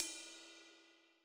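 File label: Live Ride, Alesis SR16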